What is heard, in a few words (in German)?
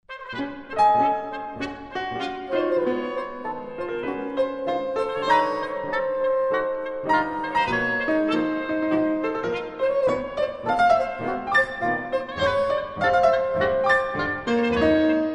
quartett; skalkottas